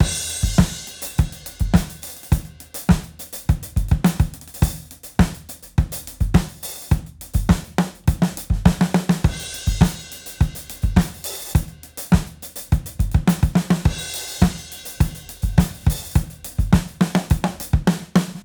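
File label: bus FX saturation tape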